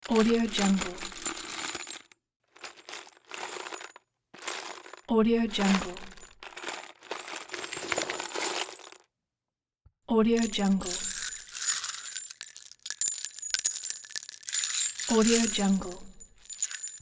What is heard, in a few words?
bullet movement